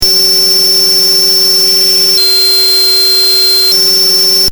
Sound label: noises